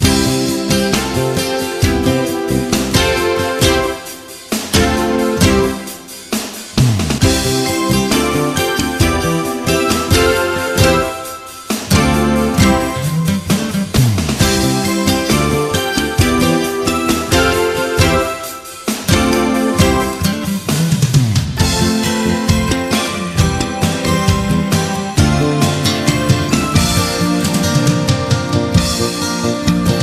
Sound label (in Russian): Рингтон бампер